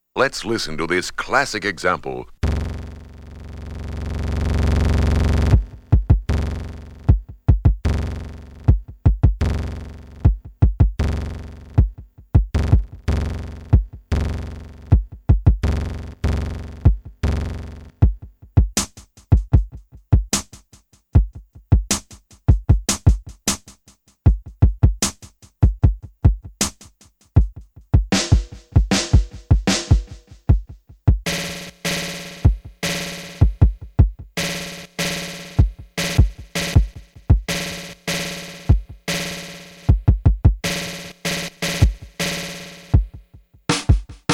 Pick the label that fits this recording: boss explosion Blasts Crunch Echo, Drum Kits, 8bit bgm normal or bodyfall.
Drum Kits